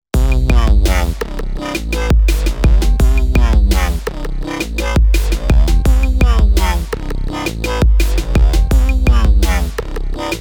Dubber

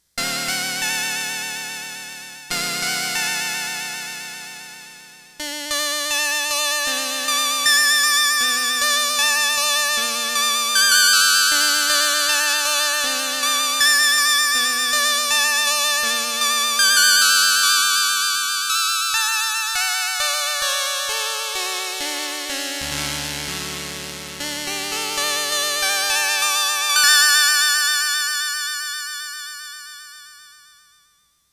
High Clavichord with vibrato, Multivox MX-20

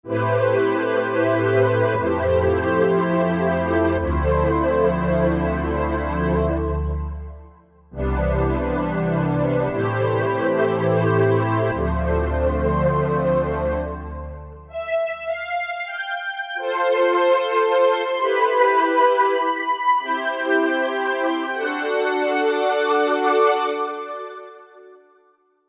Stero Str, Korg MSC-1S; Korg MSC-1S Variety

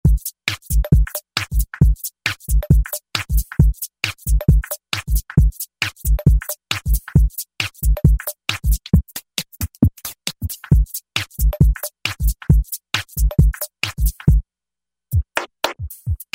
Lite Break KIT, Roland V-Synth; Factory Patches

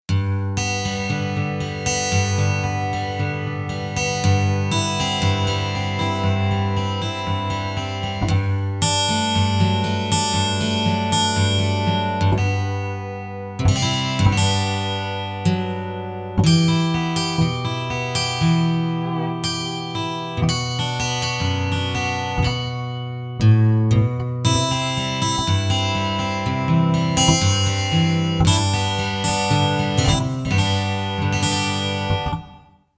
Steel Guitar, Roland JV-880; Factory Patches

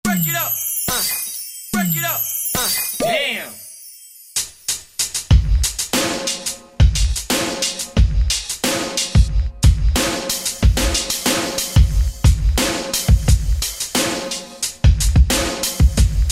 L-R WACKO Kit, Korg TR-Rack, Combi

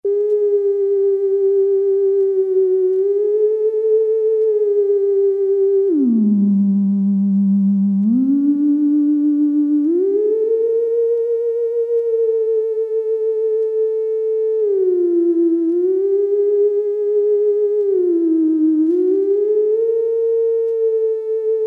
Bass Flute portamento, Farfisa Syntorchestra

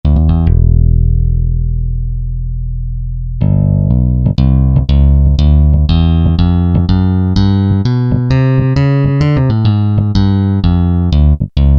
GTR Chapman Stick; Roland L-CDX-01 Rhythm Section Instruments

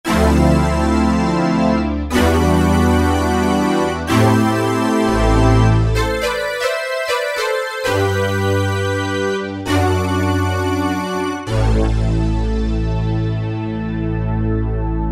syn Bigpoly; E-mu Orbit V2; Factory presets

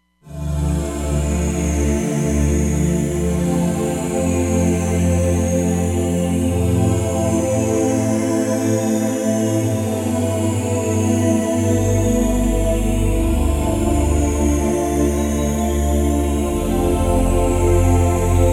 Morph Filter; Roland Fantom XR

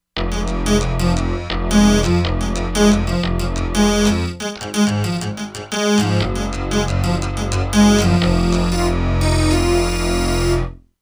Fat Biwa, Korg MSC-13S Ethnic

